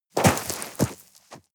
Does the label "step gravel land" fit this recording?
Yes